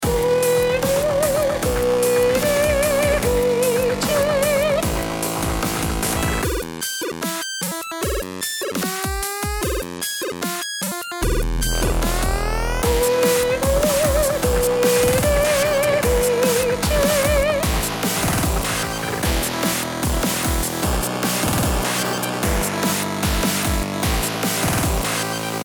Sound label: start round, music